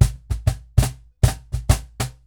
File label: Cajon Fill; musicradar